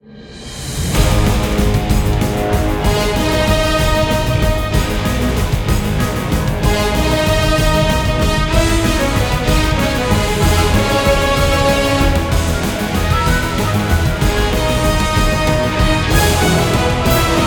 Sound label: Boss Final 190BPM; ps4; xbox series xs; Zozime